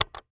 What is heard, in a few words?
button